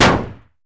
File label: hit vehicle; NASCAR Thunder 2004